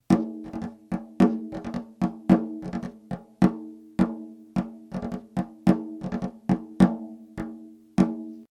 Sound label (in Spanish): pandero globo; reciclar tocar